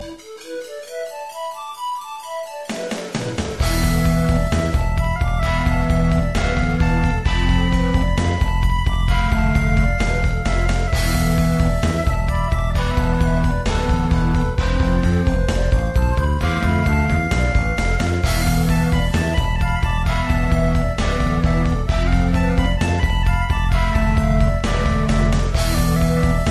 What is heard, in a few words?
Rock